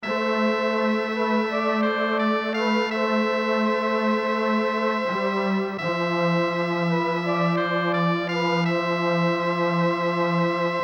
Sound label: sad town; Breath of Fire